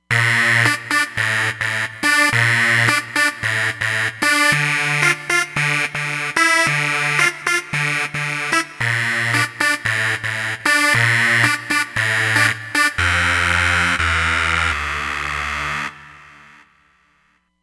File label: Wire Sync, Roland Fantom XR